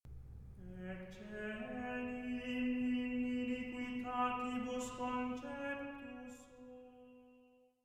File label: Gregorian Chant; Miserere; Psalm 50; Ecce enim in iniquitatibus